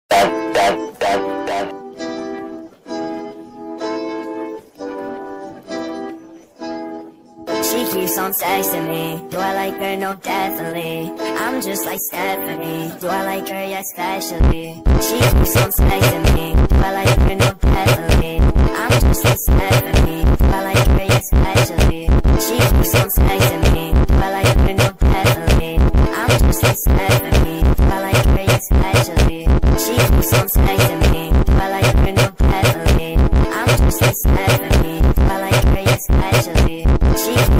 funk, phonk

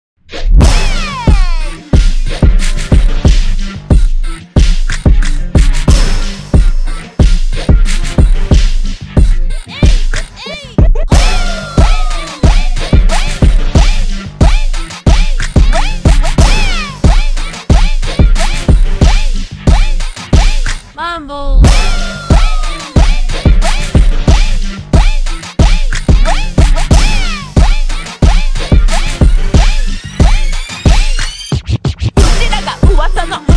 rap remix slowed

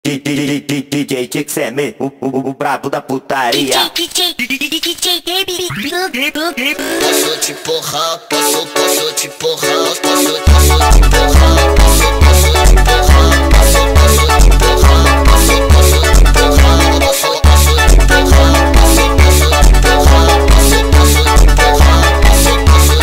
montagem sped up

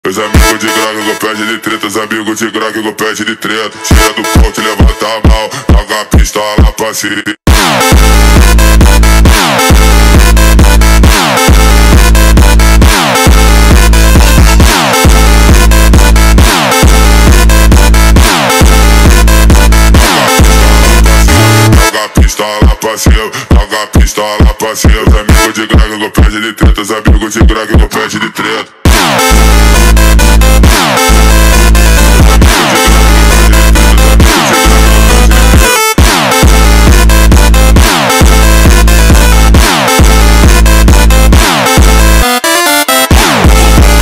funk sped up

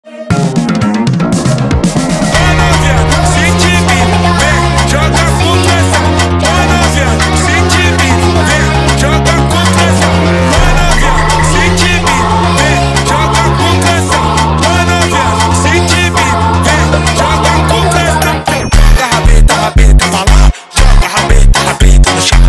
dark age funk; phonk

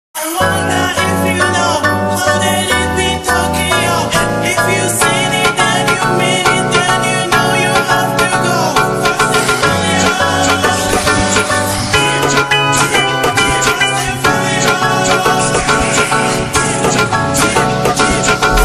brazilian funk remix slowed

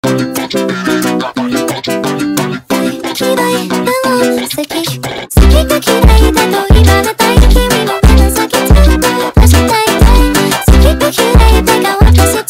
funk

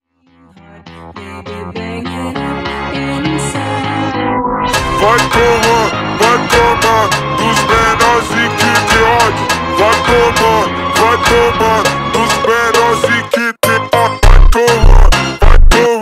dirty shoes funk slowed

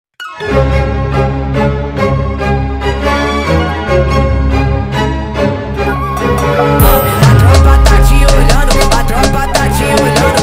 funk sped up

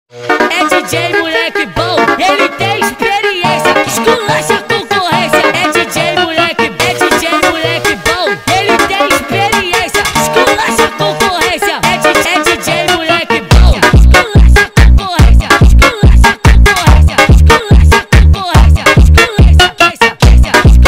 funk sped up

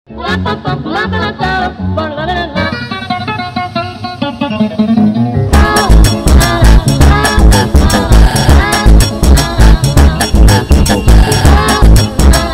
funk sped up

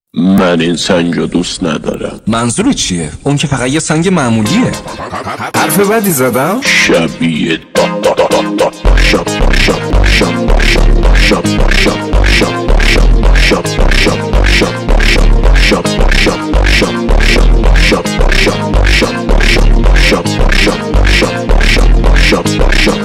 funk, phonk

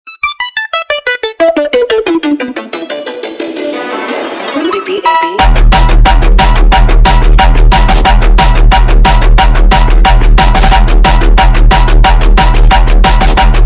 sped up

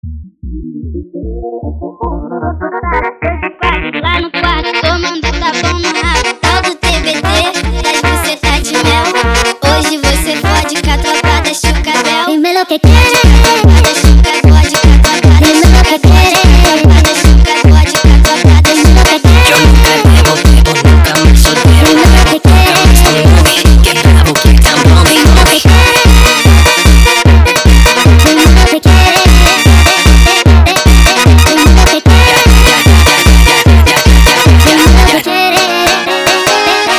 funk sped up

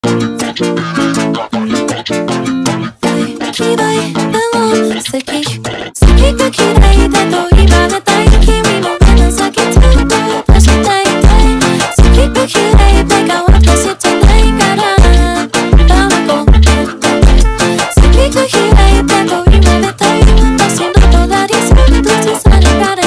funk slowed

